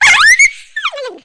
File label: Animal Dog Small Die; Deus Ex Demo; DeusExSounds